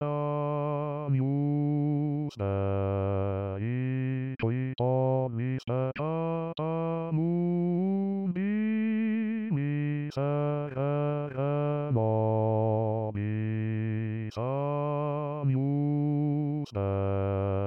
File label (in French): basse; agnus messe de st jean